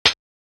Hats, Alesis Performance-Pad

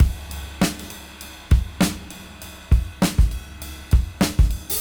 Cym Beat 99 BPM; AMG - Keith LeBlanc Phat Butt Jams

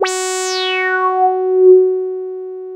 BRASS F#4; CMP - Analogon; FLORGAN

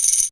Akai XR10 Tamb roll; Drums

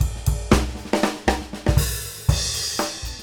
FILL

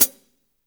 HH PD, Drum 1 Dry; East Collexion

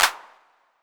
Clap, Roland TR-808; Roland MV8800; TR-808mk2

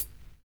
VINYL HH, HH CLSD, Drums Dance & Groove

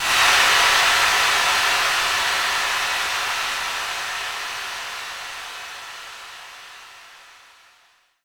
Impacts, Effects

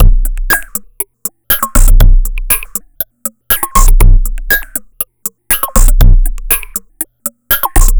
Drums Strange

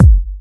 Trance Kicks

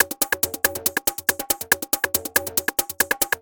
Loops 140 BPM Hihat Percussion Loops

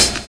HIP SAX; Univers Sons

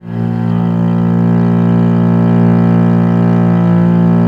A#0, VIOLE, Historical Instruments; USB Soundscan vol.23

